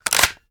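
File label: assault rifle raise first open; viper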